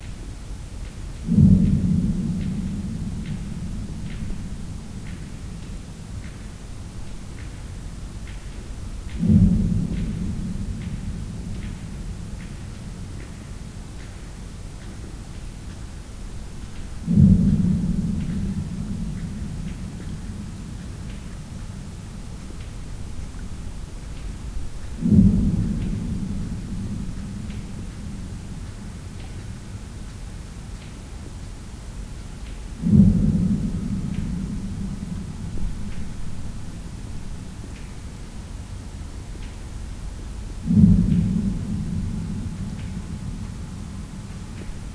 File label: seismic sounds spermwhales; hvester